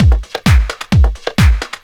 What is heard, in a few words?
drum 130 dance, drumloops; Techno Trance Essentials